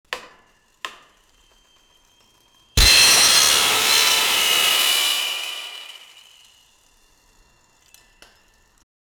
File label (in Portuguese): efeito sonoro da madeira, wood crack